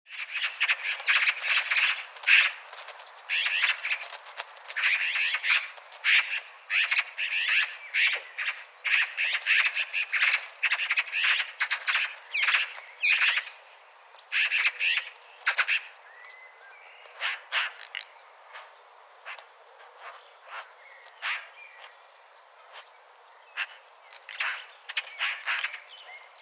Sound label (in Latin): Pica pica; SETE